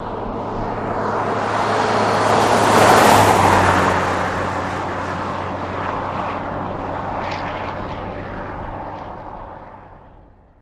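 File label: Jeep Cherokee Fast Pass By On Gritty Pavement; Jeep Cherokee 1984 Fast Pass By On Gritty Pavement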